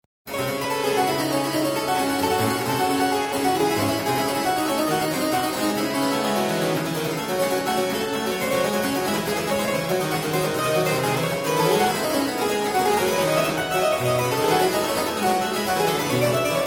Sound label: Prelude in d minor; Book II, BWV 875